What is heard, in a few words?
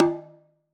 Udu Skin